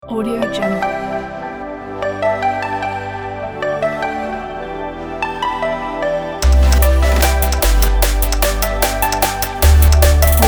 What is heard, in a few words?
summer future bass uplift; hook-music